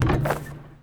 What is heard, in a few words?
Street Ball Glass Basket; SFX Kit